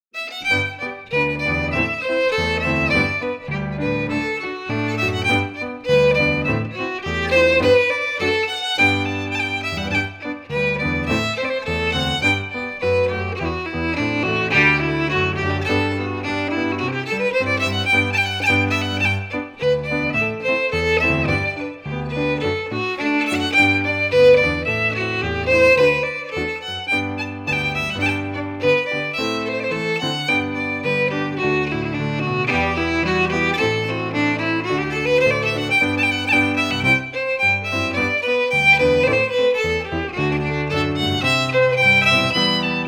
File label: Reel, FIDDLE PIANO; Jink & Diddle Book o' Tunes 2022